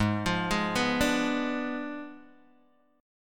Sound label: G sharp Diminished 7th G sharp, Guitar Open G; x,1,3,1,0,0